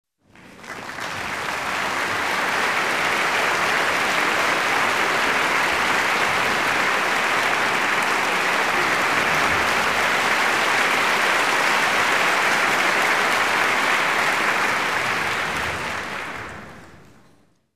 Applause in the concert hall; standing ovation

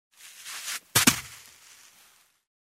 Barefoot jump in the sand; steps

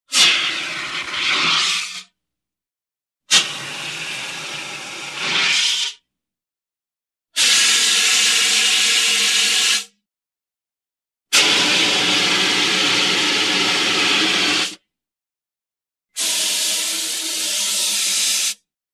Fire extinguisher

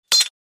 Sword blow; cold weapons

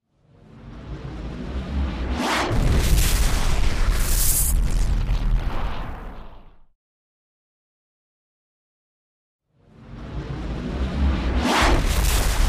Energy shot, explosion; explosions

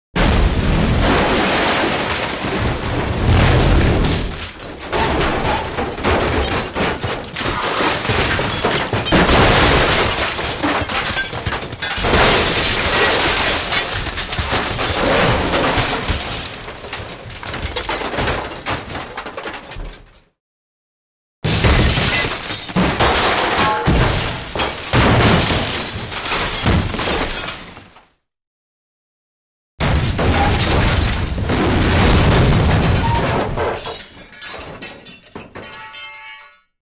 Different explosions with shrapnel

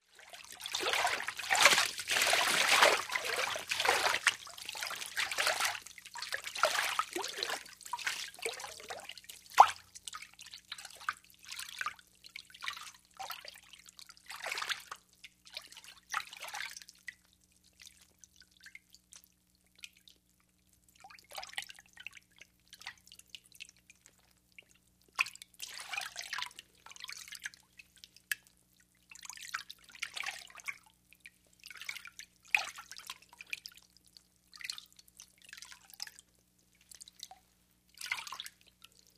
Water splash

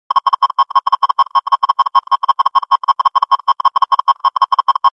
Electronic signal

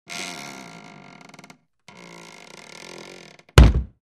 wooden door closes with creak

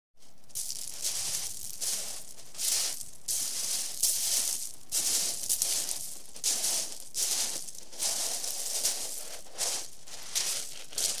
Pebble steps

